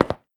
glass footstep; adams venture origins

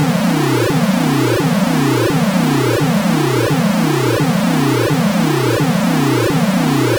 Warning; Sagaia; Master System

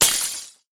Glass Impact

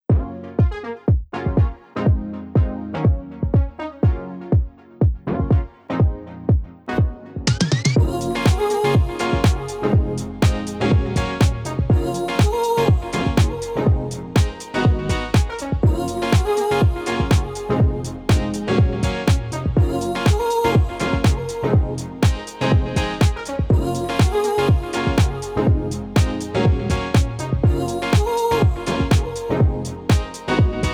Disco; IKEA Smart Home Planner

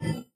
Spike; doodle jump 2; android

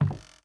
Wood soft; devour

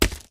Sand; doodle jump 2; android

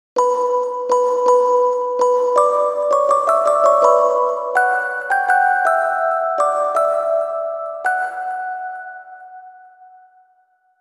Music Box; Grimoire Song; Rune Factory Frontier

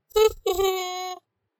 Chime; Playfellow; Fisti Fluffs; Switch Windows gamerip 2020

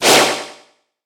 windup

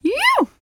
Emote Female Happy; Idle Champions of the Forgotten Realms

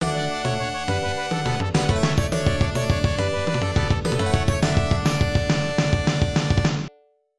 All Rounds Clear; Final Fight; x68000

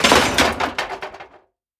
Crash Objects Medium Metal; gamerip; Car Simulator 2; android

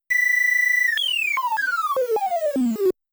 Meteor Incoming Sound; Motos

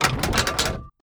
ladder down

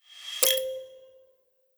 stress down

